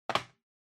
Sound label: TV Hardware RC Put Glass